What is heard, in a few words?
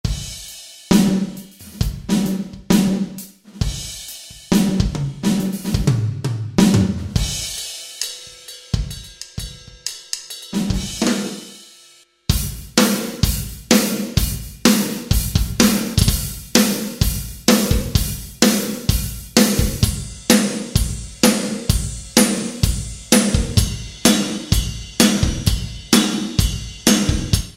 CMB - Fast Rock, Drumscapes; Northstar Productions Drumscapes Vol.1